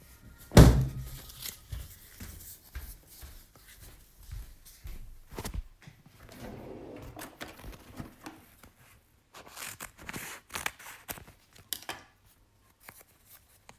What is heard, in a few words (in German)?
Schere